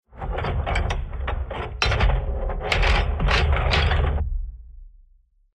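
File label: Door open close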